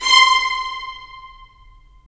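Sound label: strings violins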